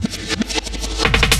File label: loop, 170 BPM, drum & bass